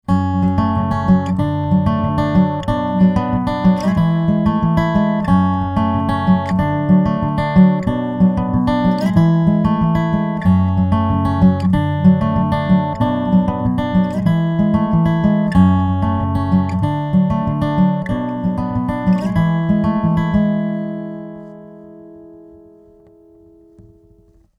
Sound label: ibanez aam700ce finger picking contact pickup